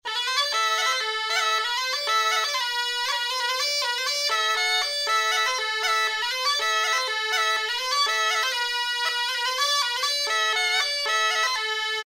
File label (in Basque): Alboka melodia LA 120 BPM